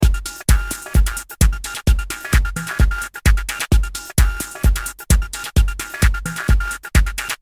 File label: Beat Micro Beat Transients; CRTIV Shumovick